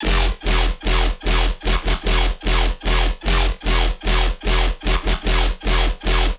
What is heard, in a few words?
bass 150 BPM full loop E